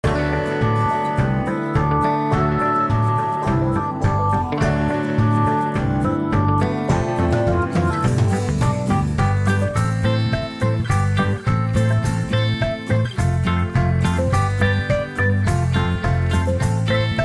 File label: Adventuring